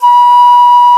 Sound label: alto bansuri; E-MU Producer Series Vol. 7 – Old World Instruments